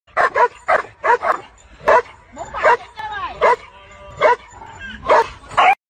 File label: dogs barking meme; politics